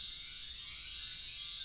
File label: Particule Chimes